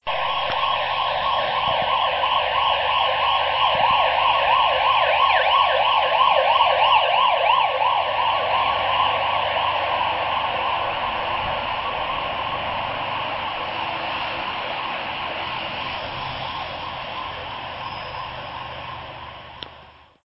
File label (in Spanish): Sirena